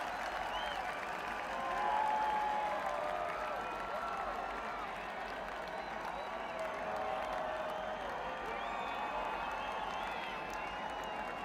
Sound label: Crowd; Essex Junction, VT - Champlain Valley Exposition